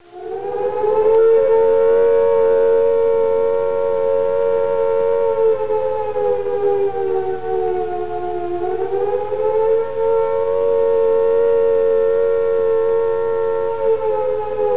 siren; mikado

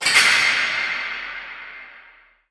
metal; de submarine

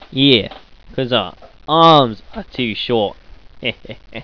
ahh, scientist

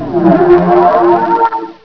pain; svencoop; sectore

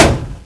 crate full, destroy

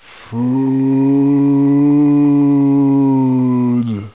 zombie idle; zm island fort final

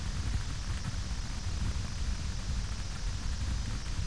FIRE SOFT; arab

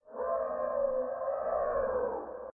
Dino Distant; docks

